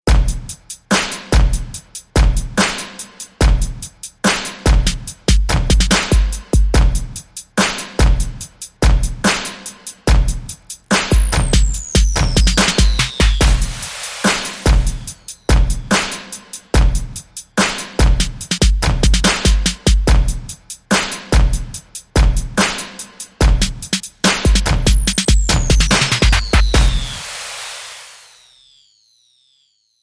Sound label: Still Beat